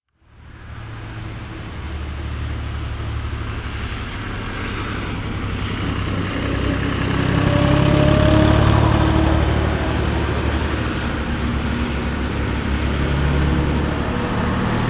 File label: Building Digger Driveby